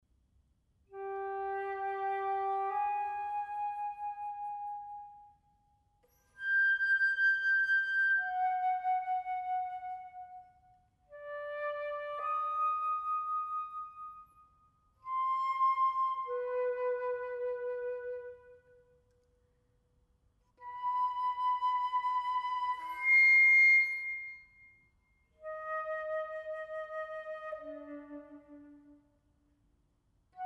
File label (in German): Sonate 2 Floeten Andante